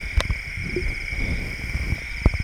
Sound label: night frogs, South India street recordings